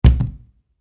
Impact Body Fall Wood Floor; Wrestling and Struggling; SFX Bible

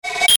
Glitch Whir Click, Glitch Electronic Warble; Production Element Imaging Element Accent Transition

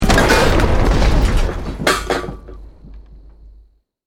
BUILDING COLLAPSE SCAFFOLDING SMALL

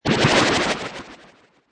Magical Sweeper Bubbles; Magic sound of casting spell, conjuring or wizardry. Witchcraft ritual summoning elemental or otherworl; Sweeper Sound Effects

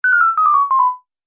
Multimedia Music; Miscellaneous Musical Sound Effects

